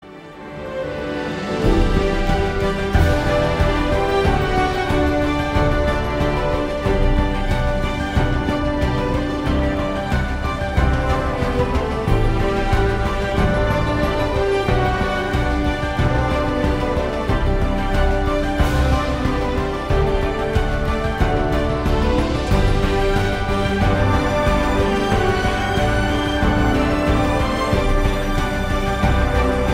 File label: Samsung Galaxy S10e, tono de llamada